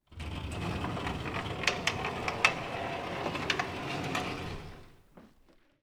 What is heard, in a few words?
Shutter Door; 99Sounds; The Warehouse